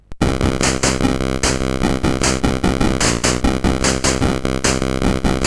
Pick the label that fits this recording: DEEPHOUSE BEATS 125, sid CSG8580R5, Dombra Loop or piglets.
sid CSG8580R5